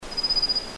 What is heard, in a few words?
Rufous faced Warbler, birdcall; Abroscopus albogularis; song; Bird Call Recordings